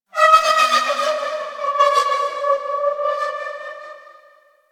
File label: Asteroid Distant Metal Textures; Ambience; Warframe; Grineer Asteroid